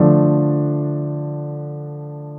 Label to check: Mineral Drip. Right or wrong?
Wrong